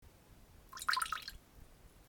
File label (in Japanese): ちょろっと おしっこ